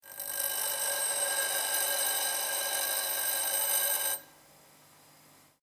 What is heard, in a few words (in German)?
Schleifbock